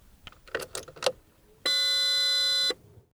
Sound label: Car Service Alert; SFX; IRL Recorded